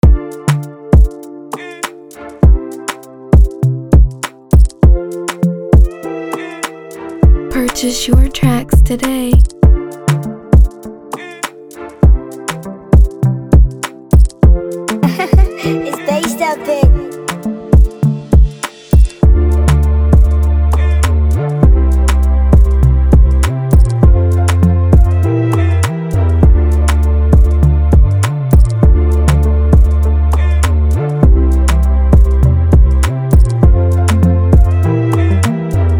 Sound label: 100bpm F major